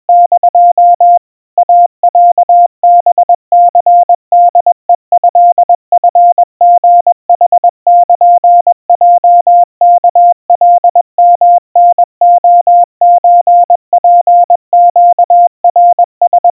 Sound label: iroha 70 cpm 700 Hz Crispy, Morse